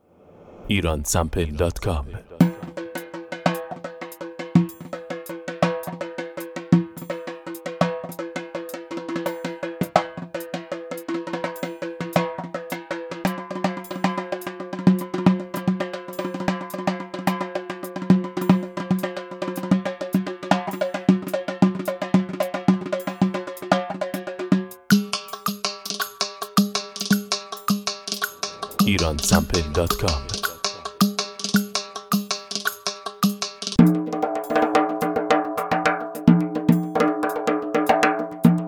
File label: azari, loops